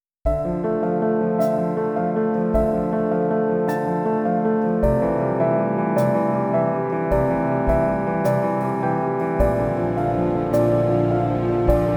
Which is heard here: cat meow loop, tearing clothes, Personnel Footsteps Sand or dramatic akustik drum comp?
dramatic akustik drum comp